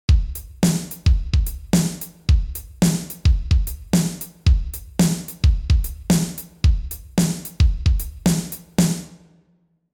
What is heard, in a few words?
yamaha cp40 stage heavy kit